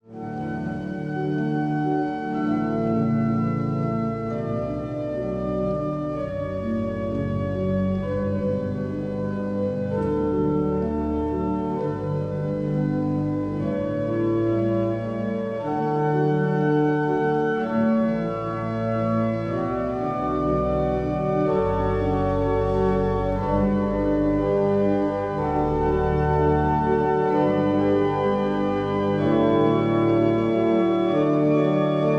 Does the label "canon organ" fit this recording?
Yes